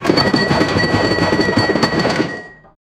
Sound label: heavy industrial machinery